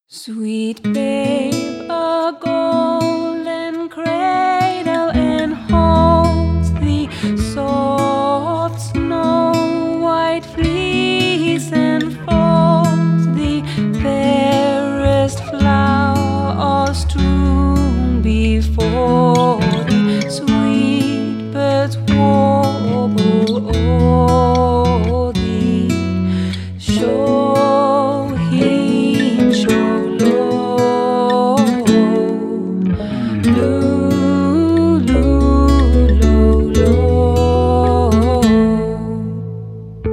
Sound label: Irish Lullaby